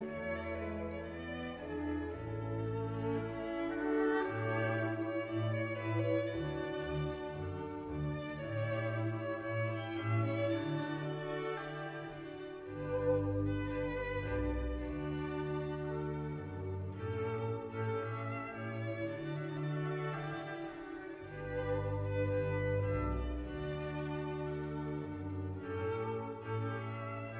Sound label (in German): Forellenquintett; Schubert